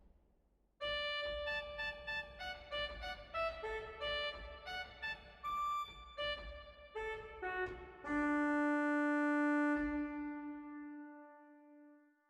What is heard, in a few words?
Pedaal Cornet 2; zwolle